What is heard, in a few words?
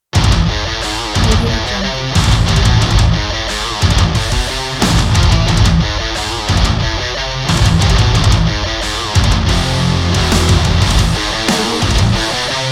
aggressive metalcore